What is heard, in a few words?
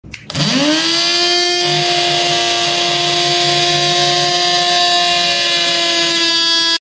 beep electronic devices